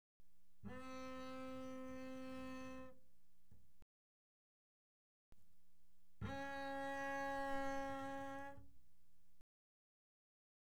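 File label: Bass arco mezzo forte sul D C4 D4, doublebass; Strings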